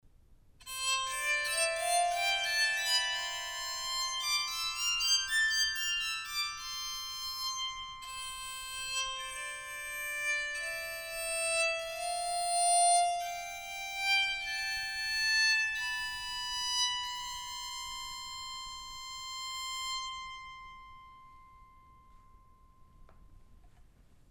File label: soprano sound, bowed psaltery; chestnut